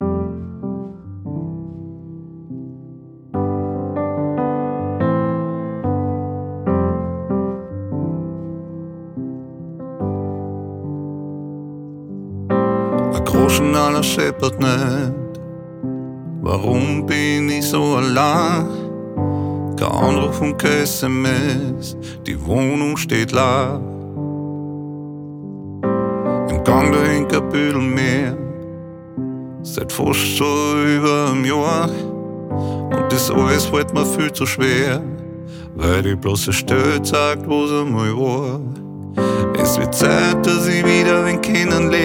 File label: Piano Version